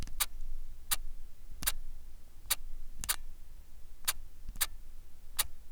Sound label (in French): TEST DIAPHRAGME